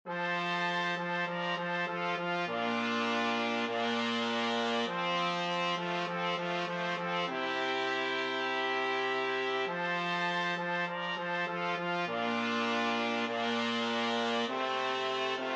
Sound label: 2 trumpets trombone